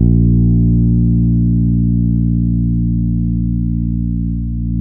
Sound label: A#1 PP, Piano cp80